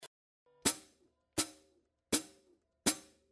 14 HH foot chicks; cymbal

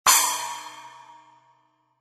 FX 9in Trash Splash, cymbal sound

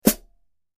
Hi Hats, cymbal; ZBT 14in HiHats

